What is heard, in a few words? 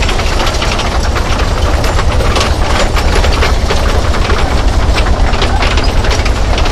tracks heavy speed, tanks; War Thunder